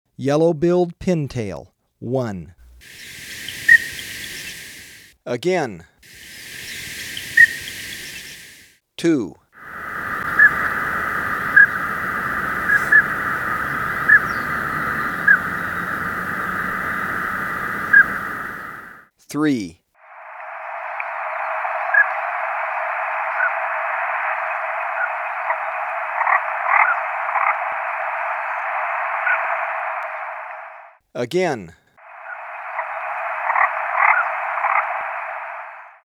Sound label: Yellow-Billed Pintail; S. America